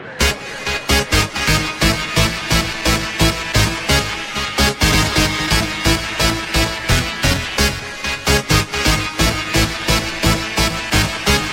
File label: Funk